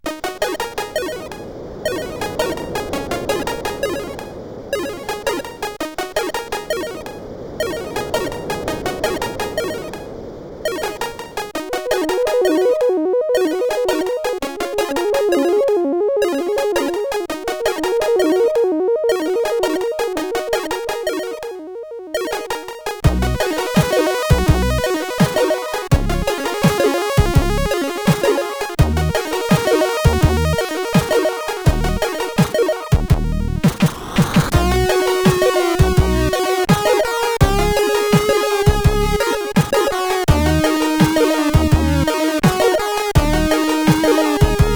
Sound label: End Tune, sid CSG8580R5; Last Truckstop 3 End Tune